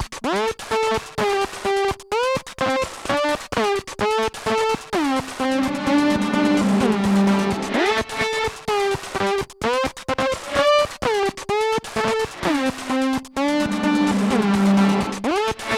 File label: synth riff